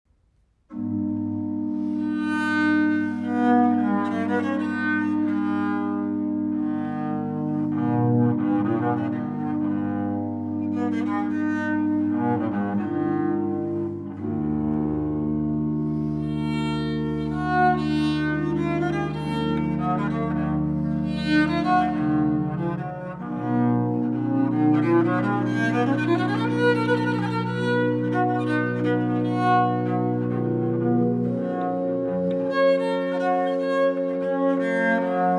Toccata Per La Viola; otgoloski hora, partitury dlya organ

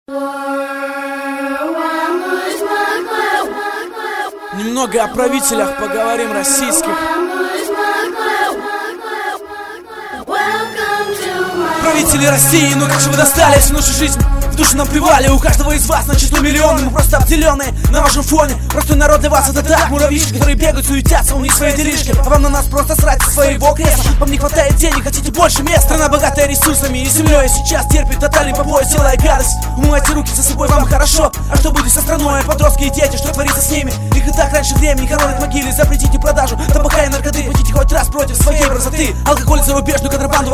instrumental

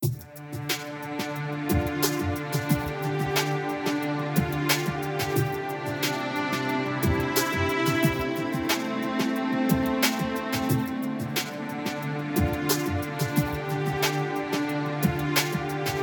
Sitar

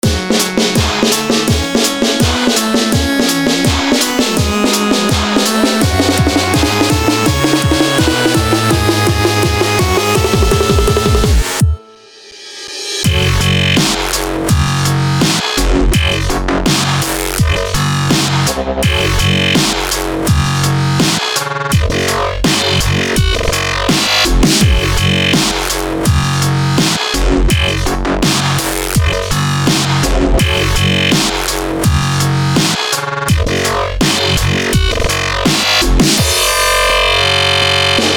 Dubstep

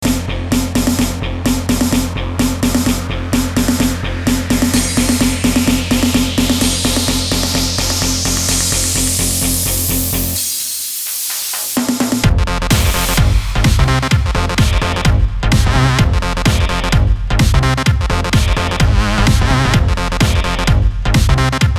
Electro Stress

